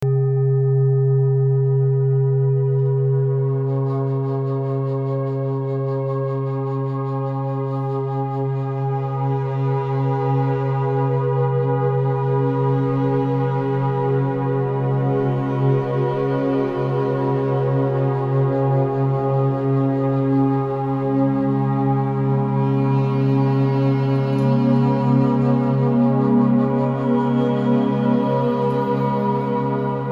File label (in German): Klangreise 3D